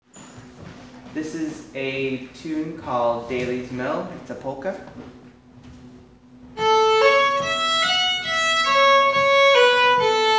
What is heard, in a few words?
Fiddle